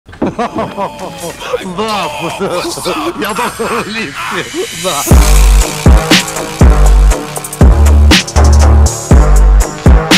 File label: phonk